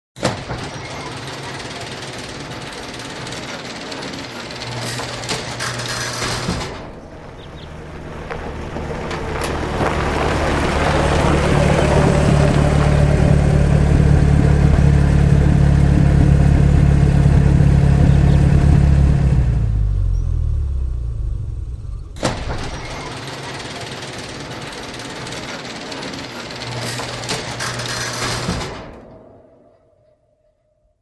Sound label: Creaky, Electric Garage Door Opens As Car Pulls In